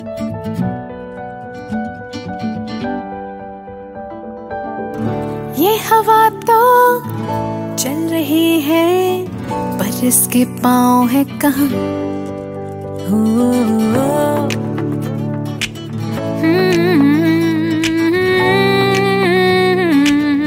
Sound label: hindi ringtone, bollywood